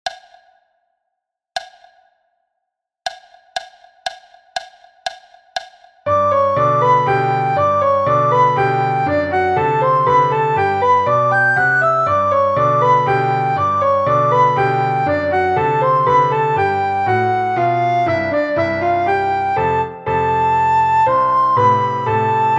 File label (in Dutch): sopraan; Flierefluiter 6